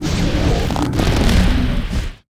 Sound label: WAR DIE; SIZZLER; Mob